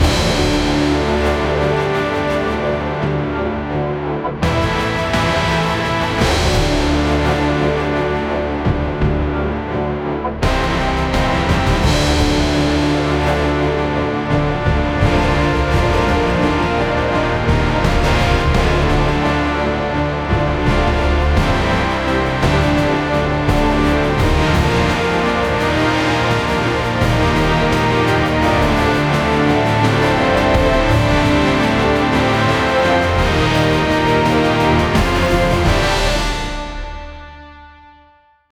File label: intense epic orchestral; orchestral music